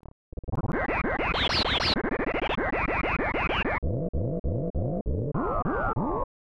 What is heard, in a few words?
Unused Jingle; Super Double Yakuman; SNES